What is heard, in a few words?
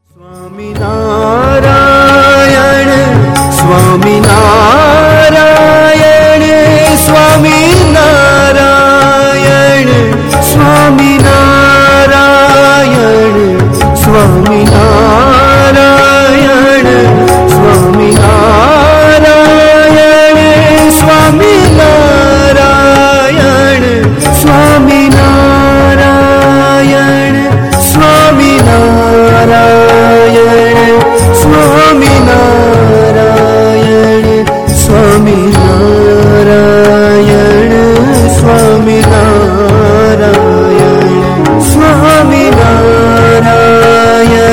SWAMINARAYAN DHUN